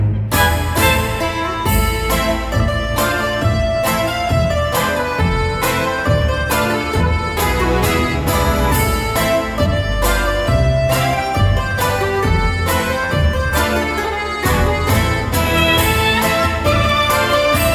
Casino Lp; JewelResort; Granblue Fantasy Versus

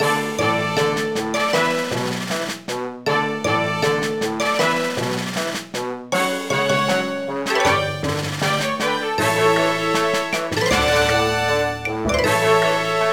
Music Victory; asterix these romans are crazy 2009 nds gamerip

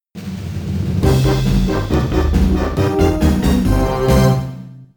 Fight Over; Backwash Bay

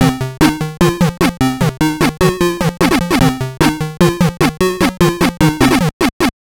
Jingle; Micro Machines Game Gear

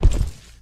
SFX Footstep

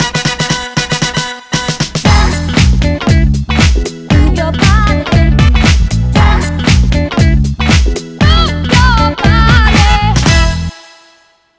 disco; ape escape 3; genie disco

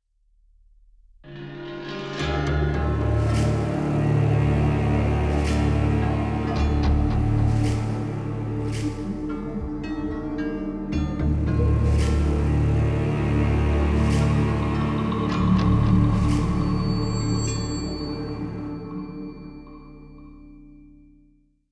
Monster Hunting; Skellige

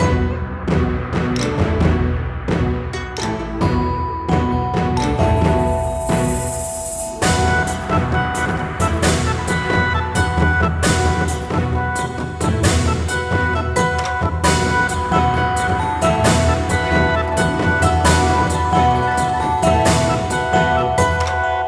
ui happy; spring fes; Identity V

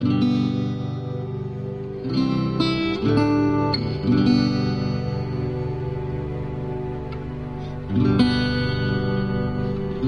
Loop Neutral

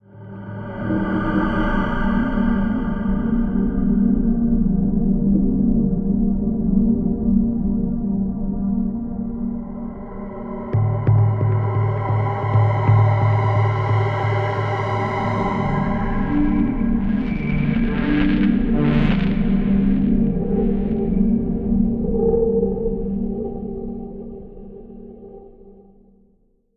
Tubular Stinger; Scourge Outbreak

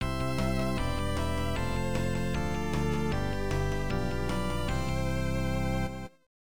(electone); Great Sluggers 94 New World Stadium; namco nb 1; arcade

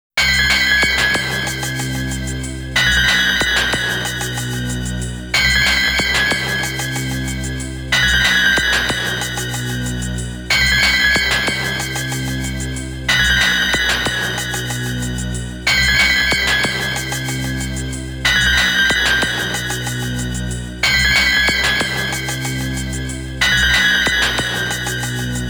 Frozen Atmosphere; doukoku soshite... original soundtrack voice collection 1998

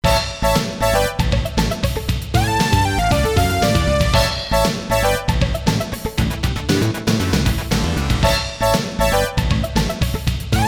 TRAFFIC JAM (MT-32); overtake

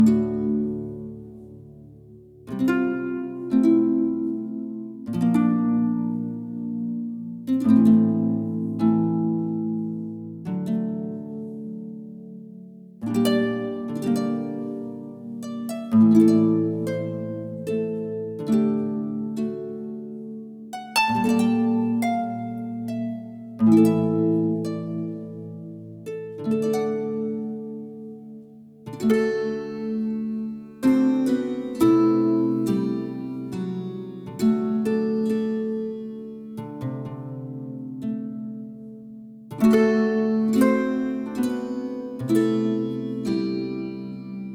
Love Theme Short Harp Only; gamerip; The Witcher 3 Wild Hunt

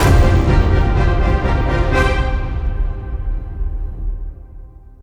hard task complete; Daemonheim

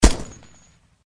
Player Machine Gun End; Granny 3; Android

